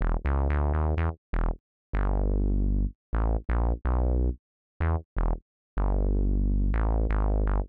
125 res synth bass E; PowerFX - Nu Jazz House

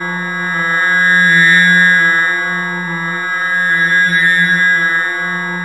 SF DRONE, NASTY RM FX; Zero-G Ambient 1

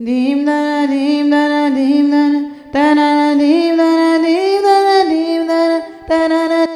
Deemdanna, Vocals, Female; Sonic Foundry (Sony Creative Software); World Pop

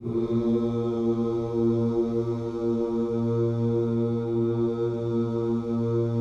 Gospel; GOSP OOS F; ILIO; Vocal Planet

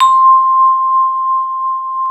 MLT VIBE

